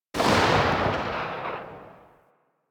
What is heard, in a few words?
Briefcase Launcher Tail; Warframe; Weapons; Corpus